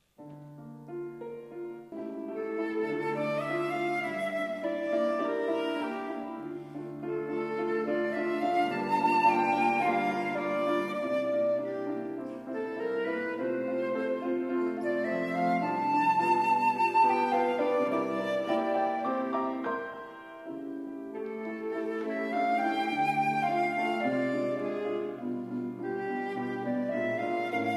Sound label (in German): Melodie